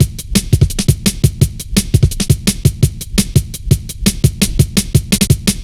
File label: wet biscuit, Drum Loops, Drum&Bass, 170; Total Drum&Bass